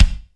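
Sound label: bass drum, real mega drums; Best Service; Real Mega Drums VOL-1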